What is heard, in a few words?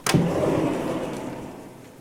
door open; Golden Crayon Sounds